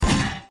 special shoot

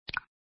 button; slotsfruitex

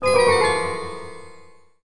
sound lose; yule

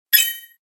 UI Score

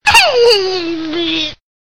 Monster Death; yule; fdfish

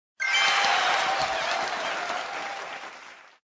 free Win

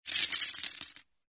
jetton showhand; goldenflower

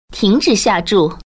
sound end wager; forestparty

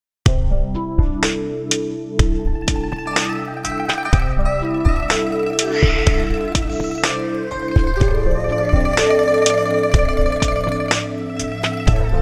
BGM Main Scene

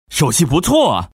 sound win small; tiger

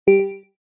Normal Add bet; hockey

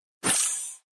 total win

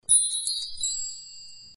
sound star

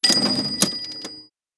sound gold

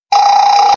score add

